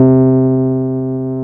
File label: C2, Keyboards Old School; USB Soundscan vol.09; AKAI